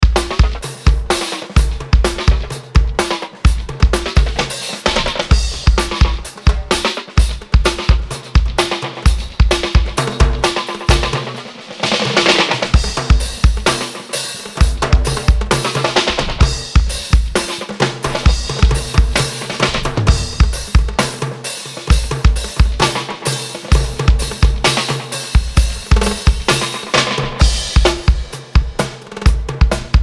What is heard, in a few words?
Drum Dub